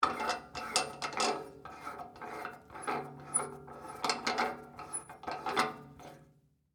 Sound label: Spigot Open, Turn, Handle, Metal, Tank, Water, Heater; Laundry Sound Effects; SoundEffects-BlastWave